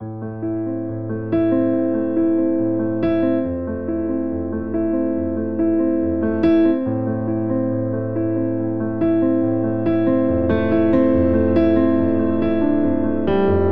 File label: Ostinato Melancholic i - VI A Minor 140 bpm, Grand Piano; MIDI Clips; Ableton